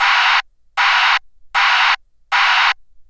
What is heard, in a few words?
broadband